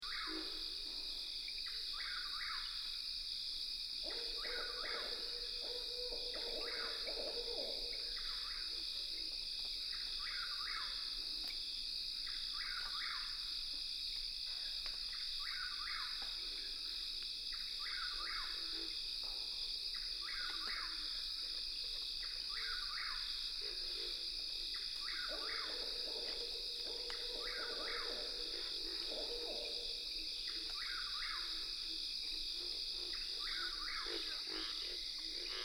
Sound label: Fugue